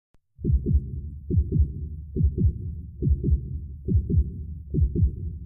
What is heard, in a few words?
music